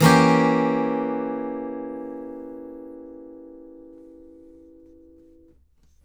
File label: ACCGTR DIMCD, Whole Lotta Country